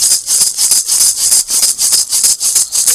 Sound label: maracas